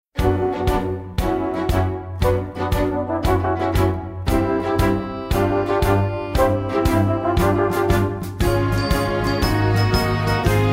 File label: transition to pirate ship